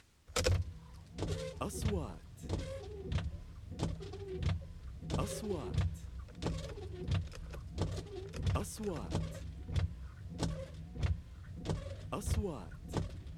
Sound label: open and close car glass scanner